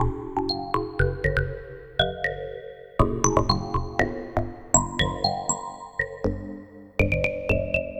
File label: Drop It, Bells & Tines; Sonic Foundry; The Electronic Music Manuscript Collection